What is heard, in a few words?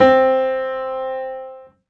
Piano, Harmonic